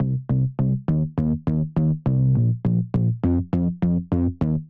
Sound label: Bass; simple session